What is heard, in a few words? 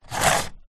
leisure toy car electric race track car manual push on track short